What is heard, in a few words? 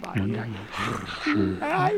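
Music Village Calm Inside; from dust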